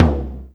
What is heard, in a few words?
Tom Low